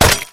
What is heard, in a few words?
crowbar hit